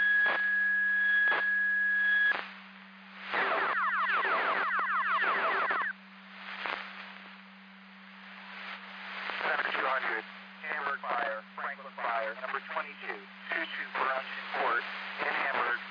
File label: Siren; Hamburg FD